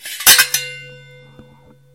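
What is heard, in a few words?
metallic ringing of sword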